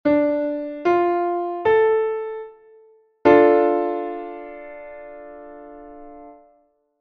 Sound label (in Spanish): acorde re menor